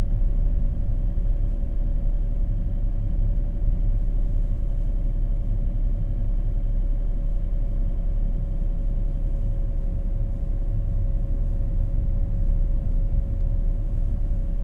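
dacia sandero ii interior cabin 1k rpm loop stereo; fvtt sonniss gdc audio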